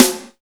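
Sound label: POCKET snare, drums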